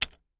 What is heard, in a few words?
click short confirm; Kurisu Makise v2.0